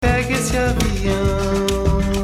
5 notes Motif

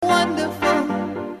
Amin7, la mineur septième

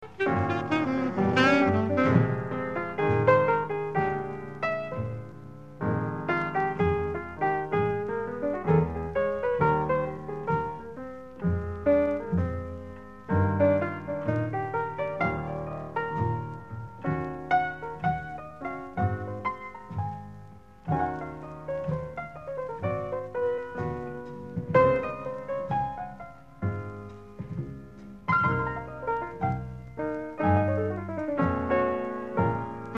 Chorus Piano

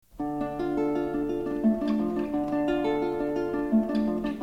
D Minor Arpege; Prelude In D Minor

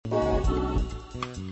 Transition Chord, accords de transition

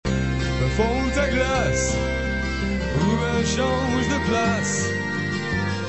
Harmonium

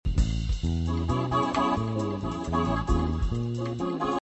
Harmonized Melody

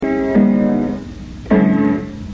Piano Mistake

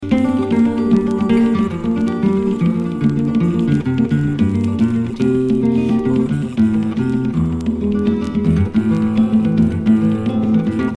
Modulation

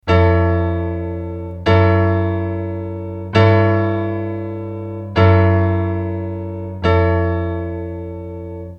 Chord Sequence

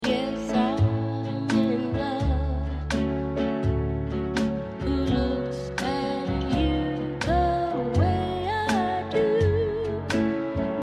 Modulation

